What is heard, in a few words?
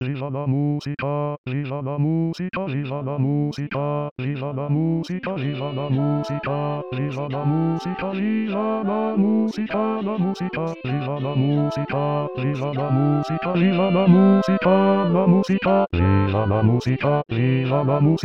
Viva La Musica Basse; chorale adultes